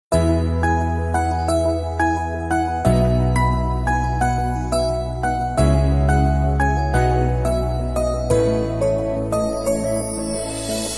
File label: BG Piano Ringtone